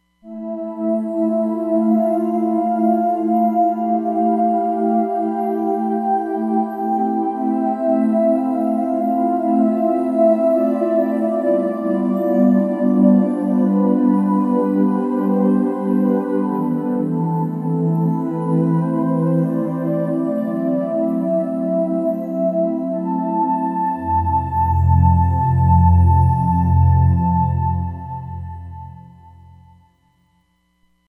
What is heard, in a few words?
Wind Pad, Roland Fantom XR